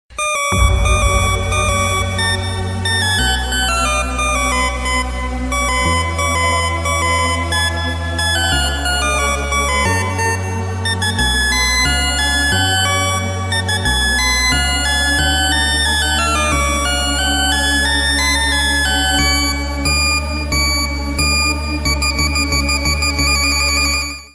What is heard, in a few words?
Symphony Melody